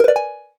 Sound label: gayageum sharp; SKY IM-A770K-VEGA-Racer; keytone